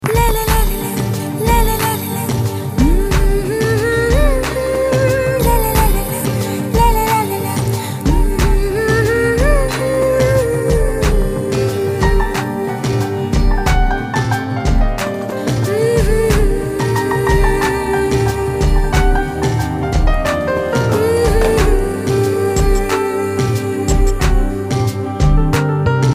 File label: Music Bit